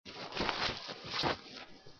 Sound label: rustling